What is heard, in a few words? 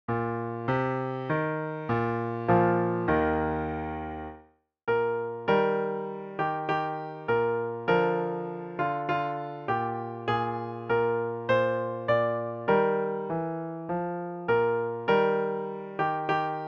duet